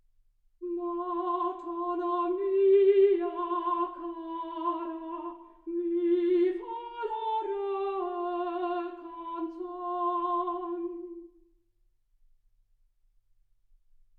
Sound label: soprano